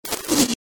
short movement sound

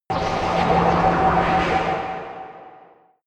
space machine alien construction

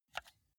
console controller arrow key press fast

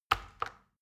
plastic button tiny click heavy slow

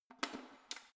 letterbox metal turning key

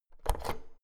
oven knob press in hard

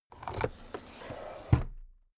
drawer cabinet stuff close regular

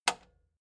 metal button spring click slow

